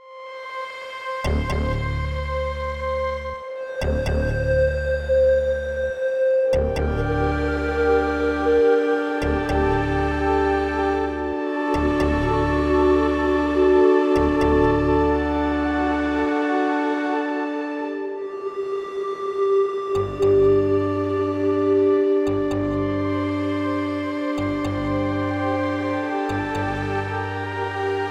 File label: dist fm osc; linear audio